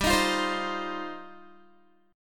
G 7th Suspended 4th Sharp 5th, Guitar

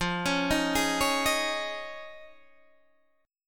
F Minor 7th Sharp 5th, Guitar, DADGAD

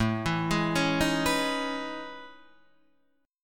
A Major Sharp 11th, Guitar DADGAD

